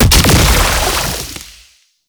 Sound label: Pistol Alt Fire Projectile Explosion; Weapons; Scaldra